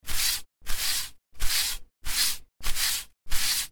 sweep

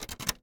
disk set, machine

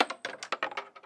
monetary offering

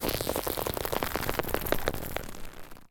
bow draw

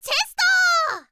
chest, girl; voice

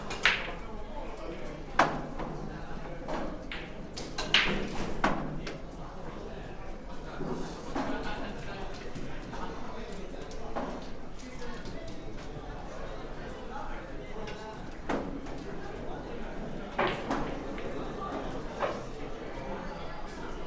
pool hall

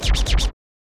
dj scratch

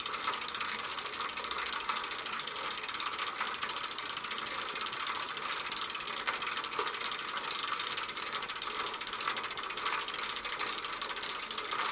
bicycle run

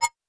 cancel, button

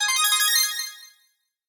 decision, button

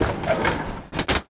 slidingdoor open

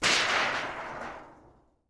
iron plate

